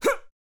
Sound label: death vocals; Boxer